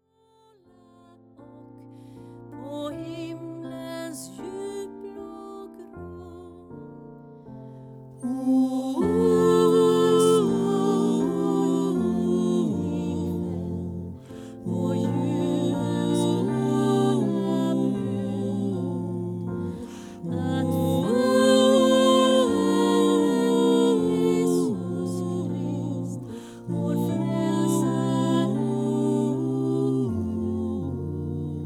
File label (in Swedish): Nu tändas tutti